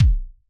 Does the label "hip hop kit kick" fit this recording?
Yes